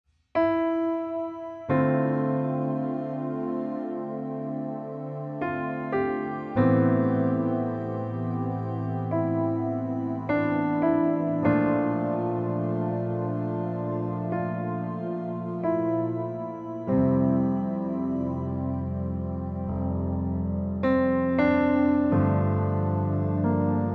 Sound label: Piano Strings Organteq